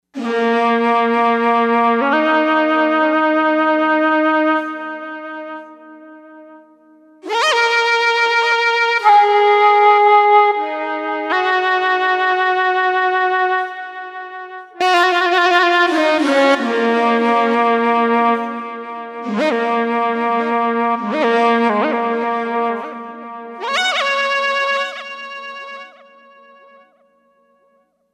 Legato Bamboo, synth

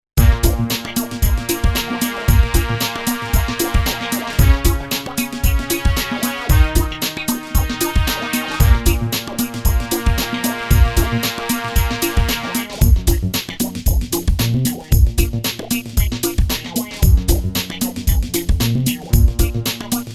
Acid Groover

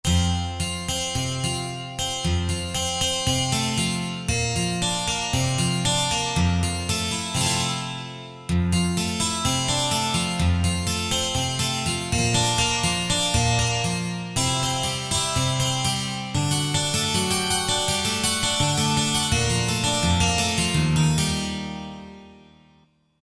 12 String Guitar, Roland JD-990; Factory Patches

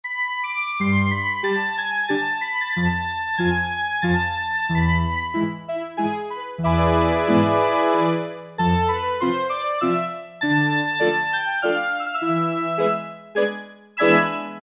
SYNTH ORCH, Casio VZ-10M; Internal factory combi

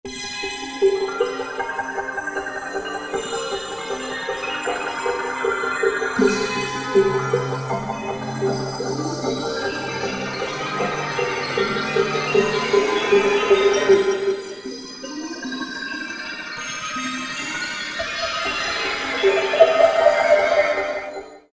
Bad Bells, E-mu Proteus VX

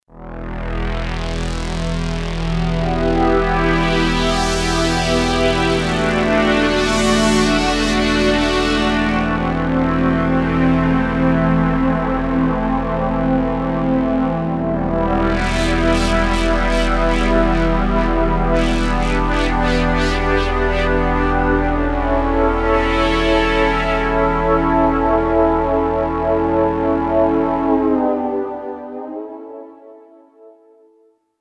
Reso Pad, Roland JV-880; Factory Patches

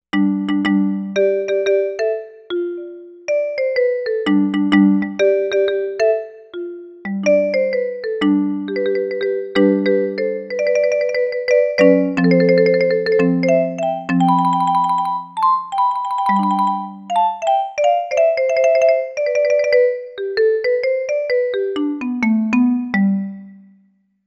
Marimba

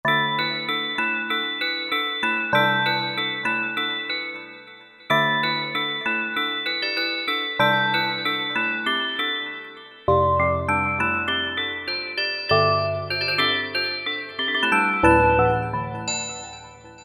ORIENTL BELL; Akai SG01v

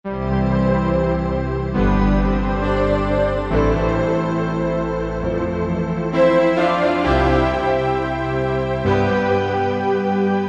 Dream Pad, Korg M1; Factory preset examples

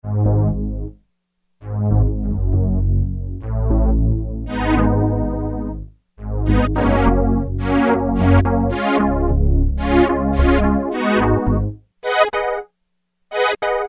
Backwards Pan L R, Roland MKS-70

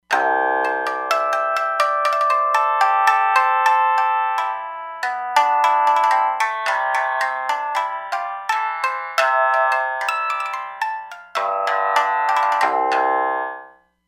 Jamisen, Roland Fantom XR

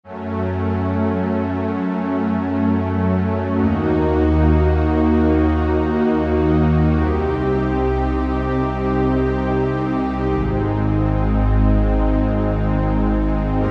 Classic JP pad

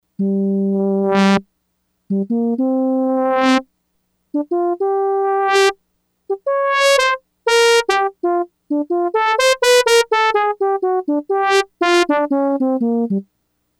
Trumpet, Yamaha DX100; Factory Patches

